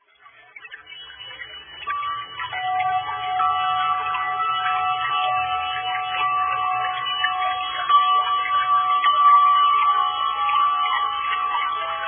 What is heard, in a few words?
windchimes mini